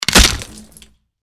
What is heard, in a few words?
mantis death; npc